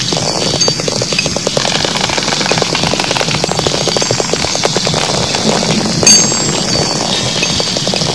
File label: leather leak; quake2